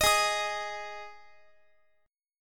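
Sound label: A flat 5th A flat Guitar, chord; Ab5 strummed; 4E,4A,5D,5G,5C,6F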